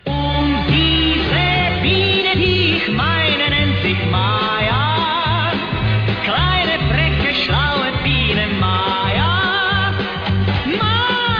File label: ringtone